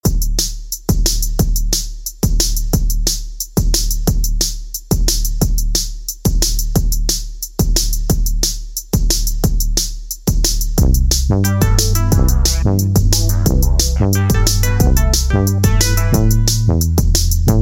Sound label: Upbeat Theme